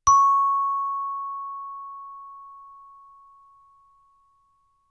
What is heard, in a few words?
Chimebar C